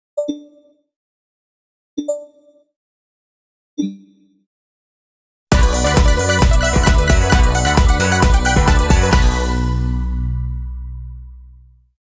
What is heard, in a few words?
base Game Sound; basketball Star Deluxe